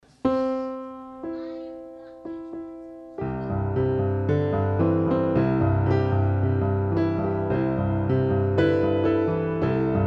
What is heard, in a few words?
Brahms Lullabye Solo; WSHR Sign Off; Housewarming Party 2008